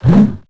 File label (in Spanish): sesgado largo grave; severance